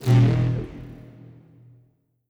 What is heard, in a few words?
detail reveal, strider; creatures; seq passby